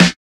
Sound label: Snares